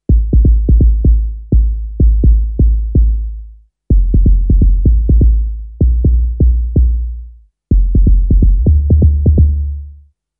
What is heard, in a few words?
Basic Bass drum